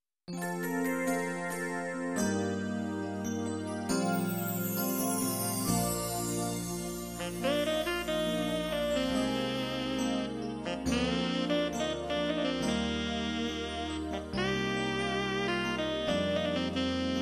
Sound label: sax